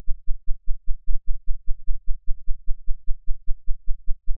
burst 32ms 1kHz; S2 trapez RCF Vecchia; Mic1 tubetto; LaSpezia; 30V; Hilbert; CESI

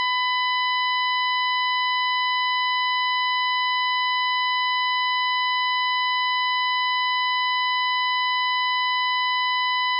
Tono Complesso

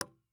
ir sub legno, dml legno adesivo; magnetostrittivi

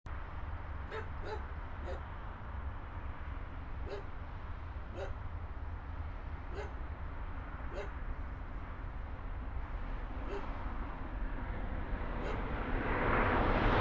Treno; Eigenmike; mono 16bits; Nuova Strada; ComunePR

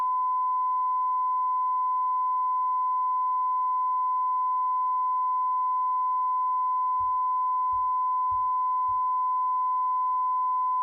Calibratore 94dB

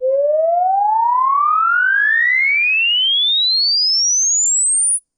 sweep 500 10k 5s trombino tondo; CESI

